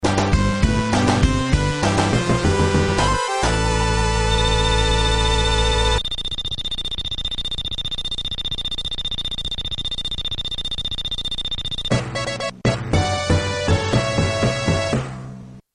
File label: stage clear; sonic the hedgehog 2